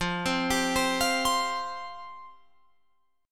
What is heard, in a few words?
F Suspended 2nd F Guitar Open F; Fsus2; arpeggiated